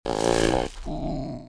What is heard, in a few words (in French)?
Grogne, Animaux; bruitage